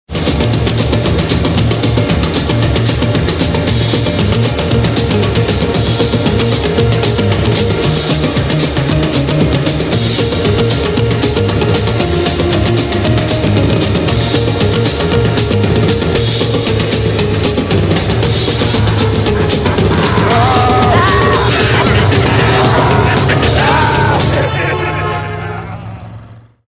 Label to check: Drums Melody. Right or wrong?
Right